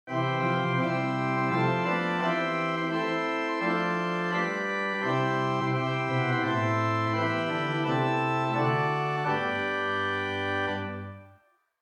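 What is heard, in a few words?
Swell Geigen 8 + Salicet 4 + Mixture, organ stops; St Annes Moseley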